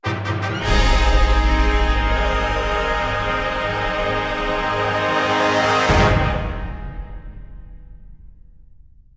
Menu Mission success

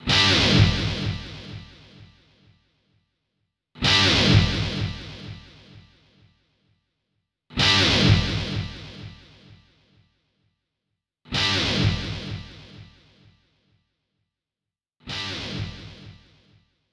Headshot Delay; orcs must die gamerip

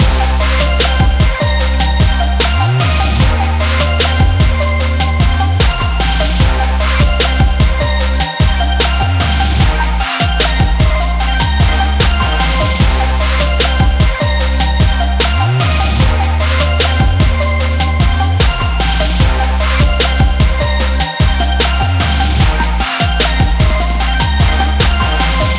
Win; forza street

